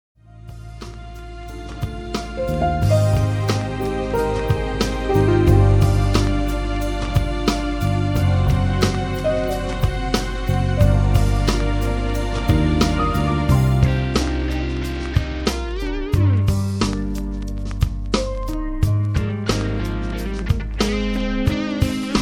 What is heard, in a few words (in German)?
Solo